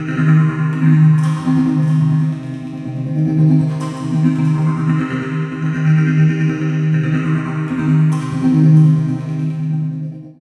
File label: reso head C, One Shot Textures; Transmission-X